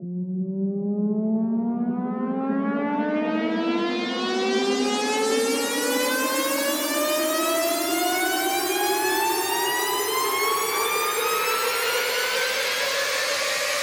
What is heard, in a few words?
Mellow Riser - 128 BPM F; Cymatics; Risers